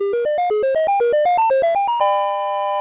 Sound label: Level Up; habitica; dewin Theme